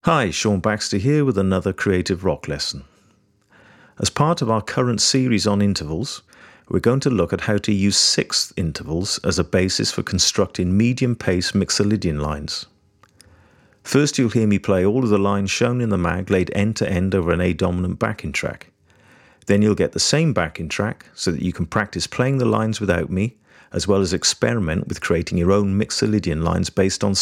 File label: Creative Rock intro, Guitar; Guitar Techniques 2019 Issue 290 January